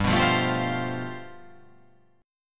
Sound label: G 11th